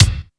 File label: KIK GAT